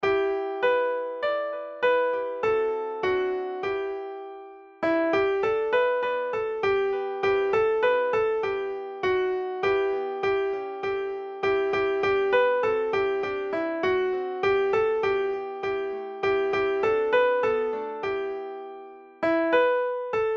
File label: Tenor, midi; 請眾高歌